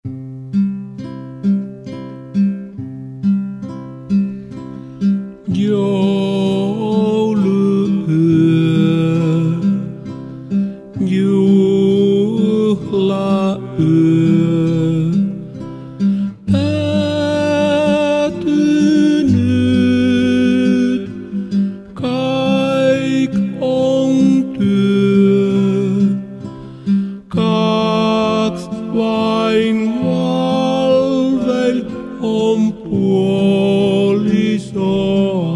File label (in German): Stille Nacht Finnisch 2 Männerstimmen